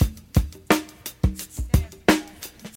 87 bpm high quality drum beat b key, Free drum loop sample - kick tuned to the B note